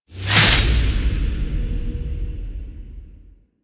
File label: Pilot Finisher Stab In; Warframe; Rail Jack; Grineer Crew Ship